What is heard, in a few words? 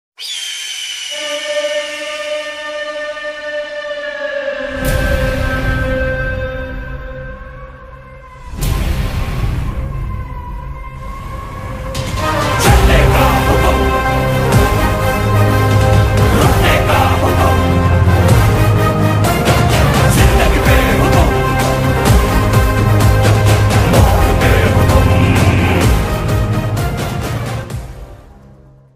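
Movie Entry Song background music Ringtone; KGF; Yash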